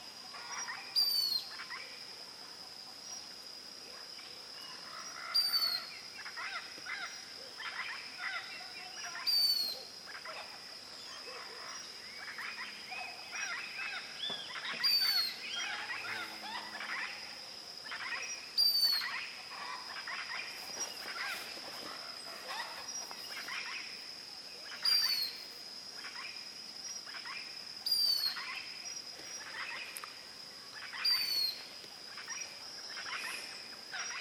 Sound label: Pied puffbird